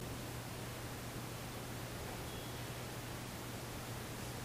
bell ring room empty; original

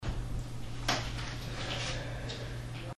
long breath

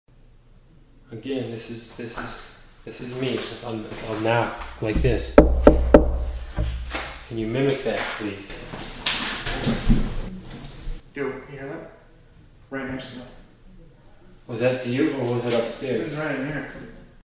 knocks; request knocks confirmed

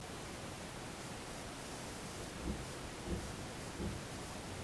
loud knocks perhaps footsteps room empty

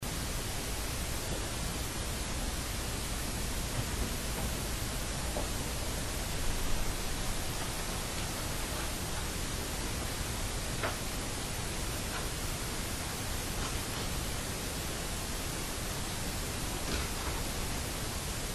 lots of sounds and movement; amplified

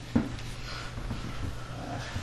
breath only

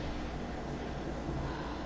breath, now or how

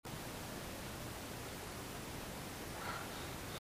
breath, room empty

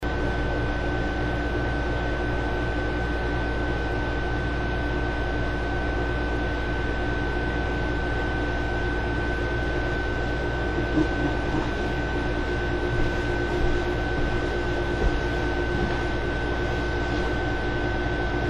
creepy laugh w footsteps; The noise you hear is the air conditioner.; This is the original file.

